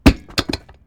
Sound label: quests fall down